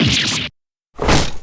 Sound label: damage; hugh